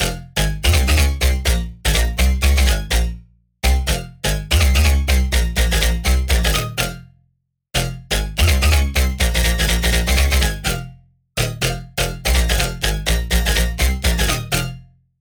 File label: Future House Loop 124 BPM Fmin; Synths; Drop Loops